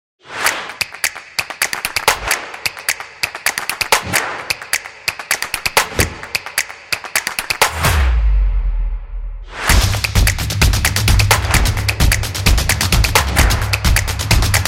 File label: Percussion